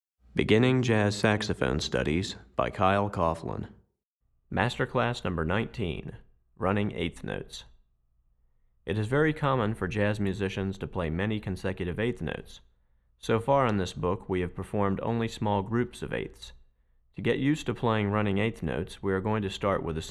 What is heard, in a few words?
Tenor Class, Saxophone; Running Eighth Notes; Master Class #19; Recordings For Beginning Jazz Saxophone Studies for Tenor, Volume 2; Kyle Coughlin